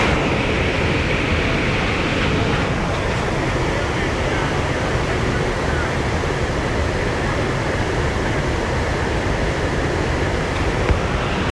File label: iguazu